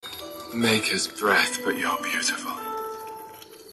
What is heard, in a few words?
Breath